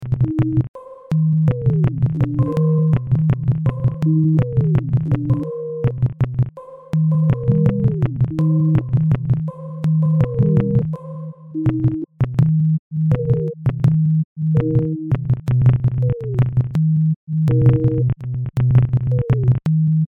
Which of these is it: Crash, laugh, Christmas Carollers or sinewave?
sinewave